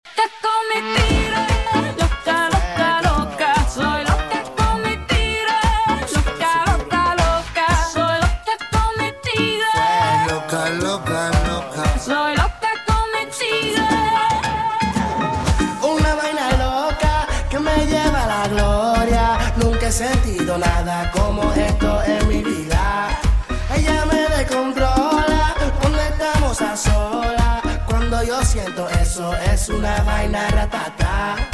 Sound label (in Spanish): merengue flow mix